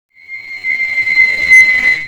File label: incoming, explosions; Call of Duty Modern Warfare